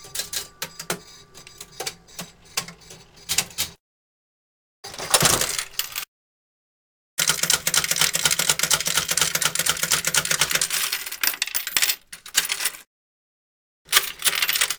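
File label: slot machines money, game hall; best service Blue Box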